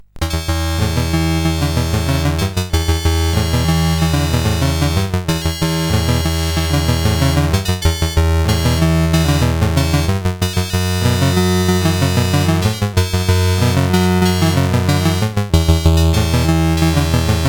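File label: Magicians Ball, MOS 8580 SID chip music, games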